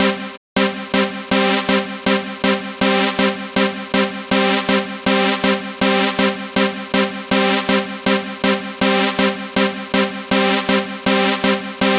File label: Dance Synths, Hardcore Loops, Synth Loops